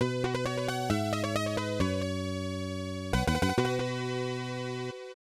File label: Level Up!; SNES